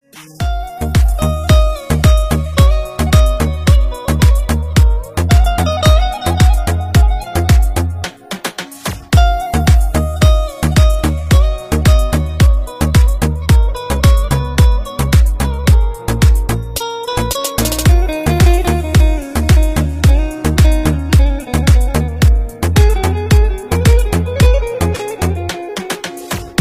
Beat

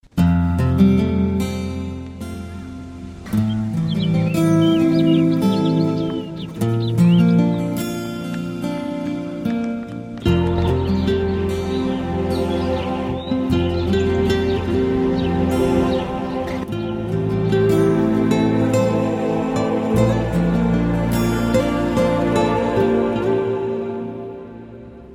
Gitar Bit

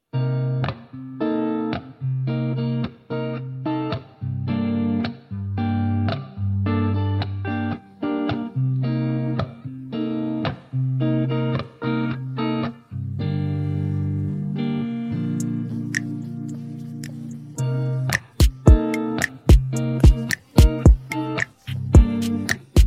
Bossa Nova

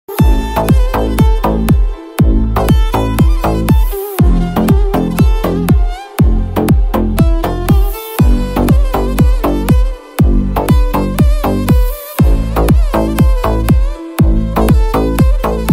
ARABIC VIOLIN

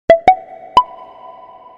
Bubble Pop Sms

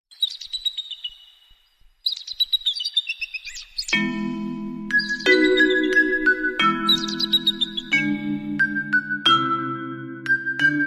Magical Alarm

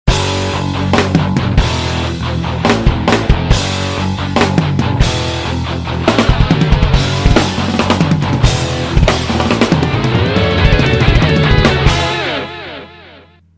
Heavy Guitar

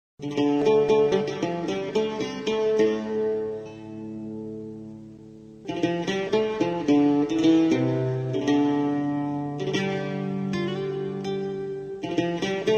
Kabyle Seddouk

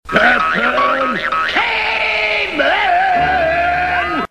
Funny Cavemen

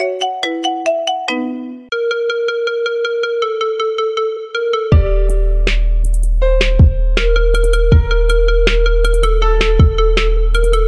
Marimba Remix

